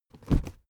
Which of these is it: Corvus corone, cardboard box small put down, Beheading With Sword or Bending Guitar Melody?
cardboard box small put down